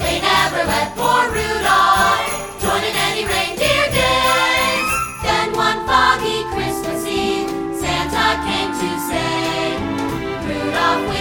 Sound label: Vocal